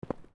Footstep Stone Light

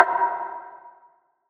Perc; Numb; Cymatics; Trap Starter Pack; Percussion

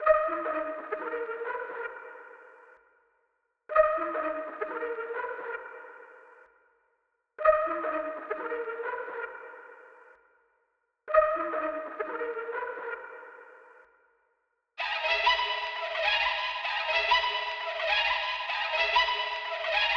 130 BPM G# Min Lead; Loop Stems; Cymatics; Hyperactive

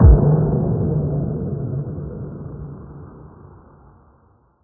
Impact Slo Mo; Riddim; One-Shots; SFX; Ghosthack; Riddim Essentials Volume 2

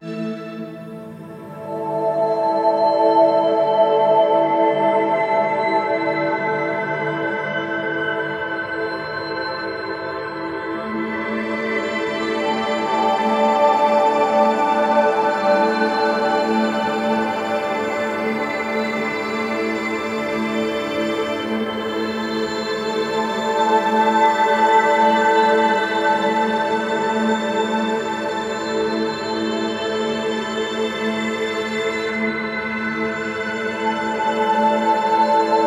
Synth Pad - A 90BPM; STEMS; Ghosthack; SkyRealm; Mood Kits; Mood Kit 02 - Afterlife

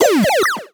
Retro FX; Cymatics; Video Game Sample Pack Vol 1

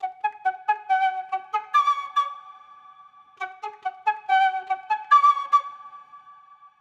Flute Loop Wet 141 BPM F# Min, Processed; Melodics; Instrument Loops; Flutes; Cymatics; Cobra Hip Hop Sample Pack

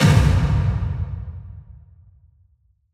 Epic Drum; Psytrance; FX

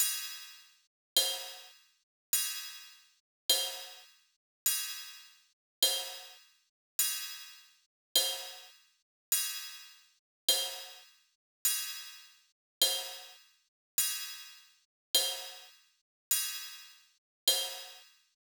Drum Loop - 103 BPM Cymbal; Loop Stems; Cymatics; DOPE DRUMS VOL. 1; Street

